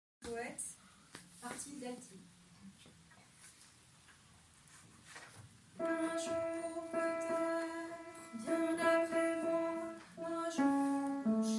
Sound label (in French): altos